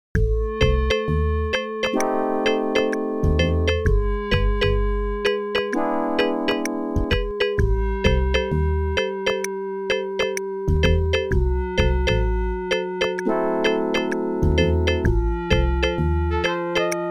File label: OPL2; The Voodoo Lady